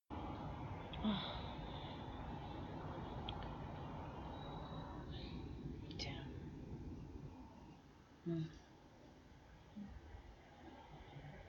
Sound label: Moans, Brazilian accent, City noises; f4m; a little [Spanking] mentiond of [teeth markd]; Fucked in the window again